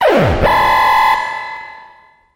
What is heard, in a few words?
alarm, zaps and beeps